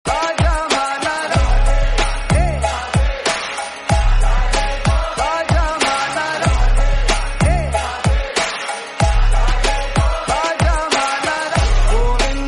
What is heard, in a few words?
Bhaj Mann Radhe Radhe Ringtone